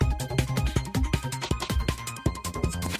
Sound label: music japan intro sting; lightning speed